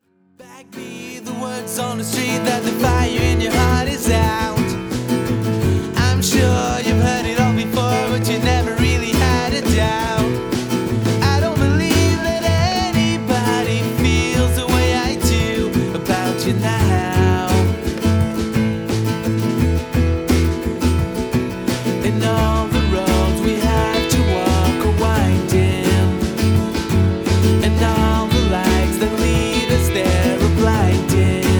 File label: Cigar Box